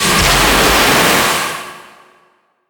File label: Fumerol Blast